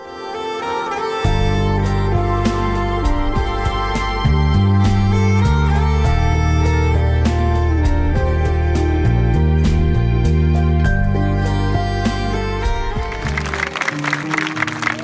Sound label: sax wedding